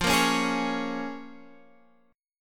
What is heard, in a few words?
Bb Minor 7th, guitar